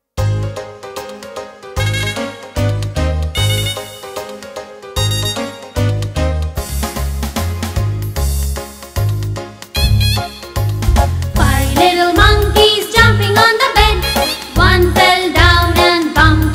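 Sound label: english nursery rhyme; five little monkeys jumping on the bed; 3d animation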